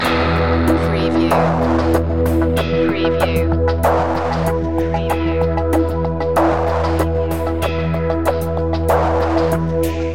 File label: acustech loop